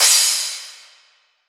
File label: hit finish; osu